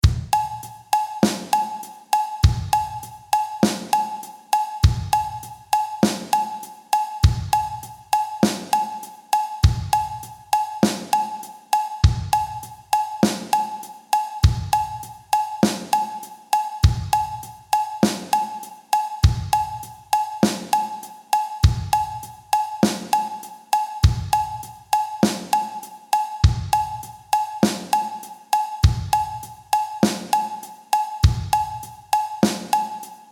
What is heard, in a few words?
sixteenth note drum groove e and a